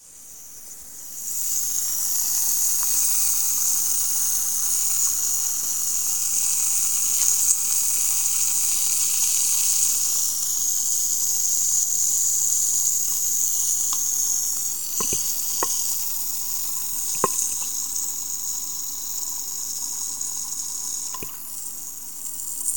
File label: vitamin tablet and ambient bathroom noise